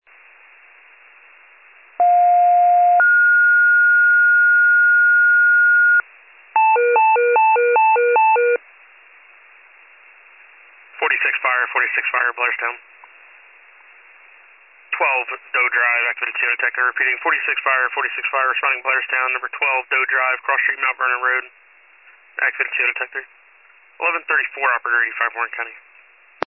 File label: FIRE pager alert